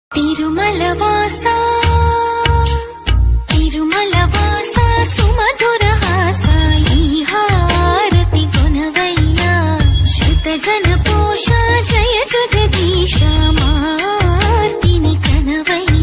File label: telugu song